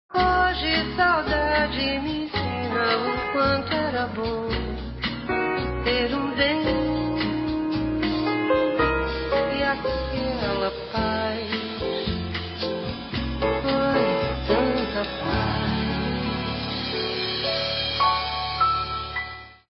Bossa; Motorola RAZR V3xx

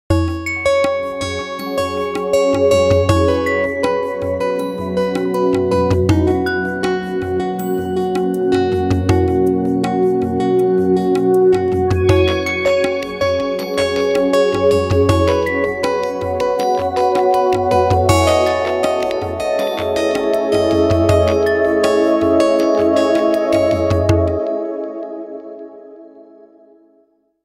Tap Circle, Nokia Tones

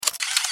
camera click; Infinix Race Bolt 2

